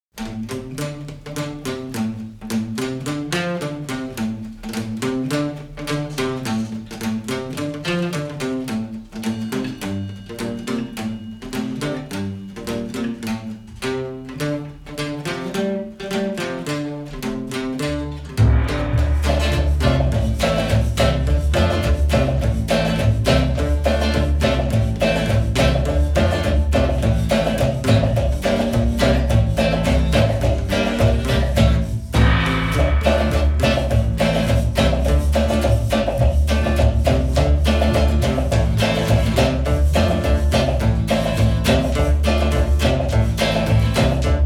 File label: reggae